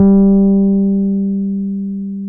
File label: PNO, H.Pianet; Roland LCDP10 Keys of the 60s and 70s 2